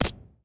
boomerang catch

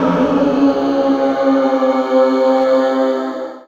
destroy skulls; death

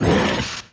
boss spawn; horizontalrunner

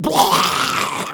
spider coughs

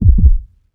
heartbeat faster

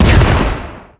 rocket explode

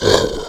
boss lite roar

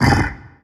death spawn vox

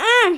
hurt grunt; player

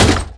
slot spawn

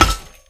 scythe break

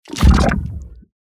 mother slime ball shoot; finalmother

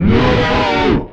monster roar

